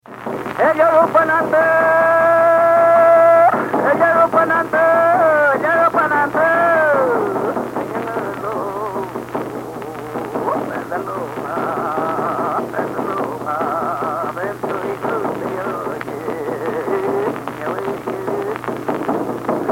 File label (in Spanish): Canto con kultrún. Mujer y hombre; Música Mapuche